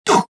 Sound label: jump, rodian